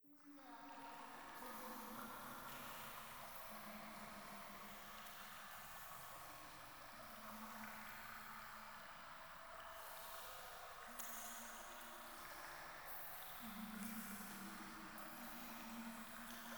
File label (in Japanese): ゴースト フェラチオ 川のせせらぎ